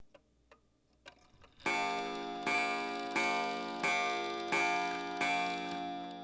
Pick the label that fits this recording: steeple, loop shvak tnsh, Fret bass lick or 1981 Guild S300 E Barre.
steeple